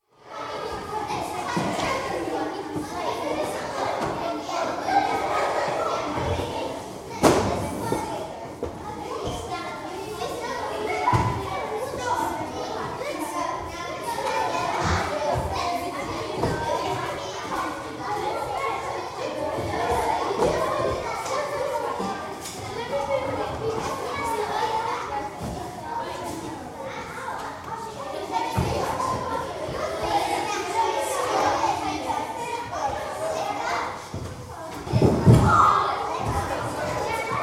Primary School, junior girls' gym class (quite active), general atmosphere with equipment used & whistle blown twice at end of track; School Sound Effects; BBC